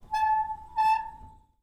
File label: SQUEAK Metal Hinge mono; Don't Be Afraid The First Toy